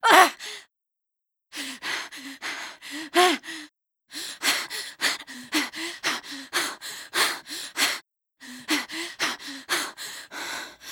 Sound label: female out of breath; Zomboid 41.78.16; Project Zomboid